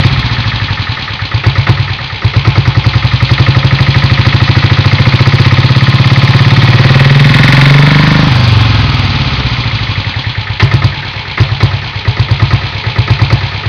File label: ENFIELD DIESEL SOUND